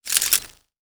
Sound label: Button Click; Custom UI Sounds; Call of Duty Advanced Warfare